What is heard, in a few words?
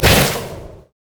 human idle recall; Elise